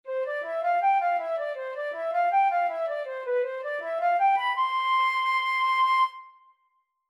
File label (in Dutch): dwarsfluitmuziekje